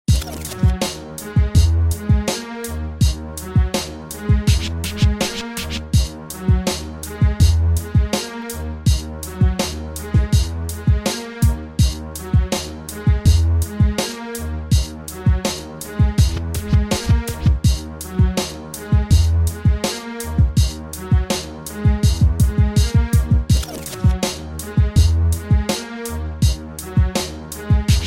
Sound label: Space Age Beat